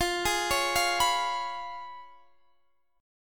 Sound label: C sharp 7th F, chord